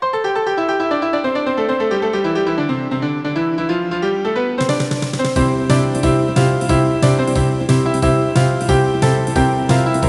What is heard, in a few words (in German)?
kinderlieder playback